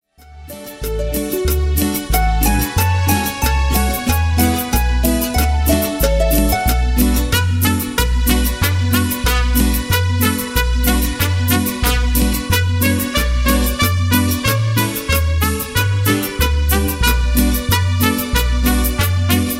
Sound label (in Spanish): mariachi